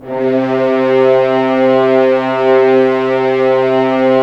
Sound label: Horns; Roland - LA Composer vol. 4